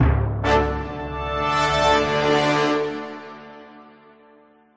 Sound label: win; nickelodeon basketball stars 2015